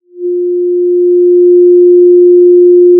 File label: pop, Sound of a cruise ship horn